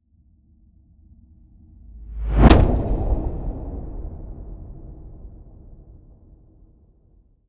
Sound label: Swell To Impact; bottlerocket